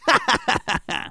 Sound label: LAUGH; Worms Armageddon; Soul Man